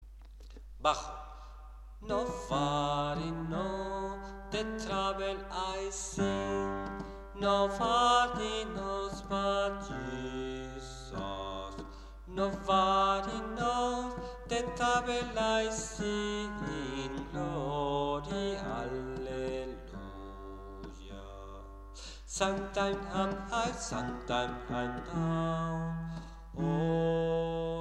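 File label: bass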